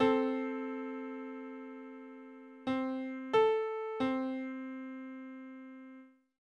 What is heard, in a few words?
Major sixth on C